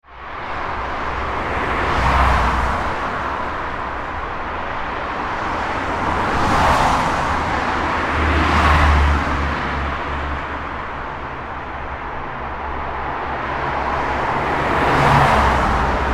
ambience urban traffic main road med wet, Traffic Urban Wet Main Road (40Mph); Traffic Ambience On Main Road - Medium , Approx 40 Mph On Wet Road Surface; Traffic and Cars; Backgrounds; McKinney Sound